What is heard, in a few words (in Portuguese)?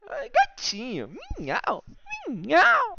gatinho miau